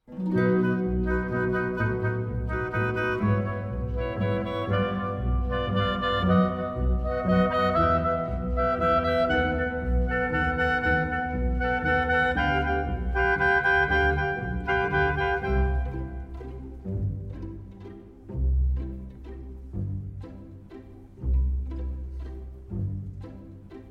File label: piano